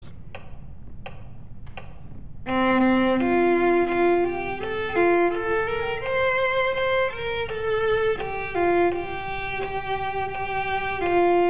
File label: fast viola; simple gifts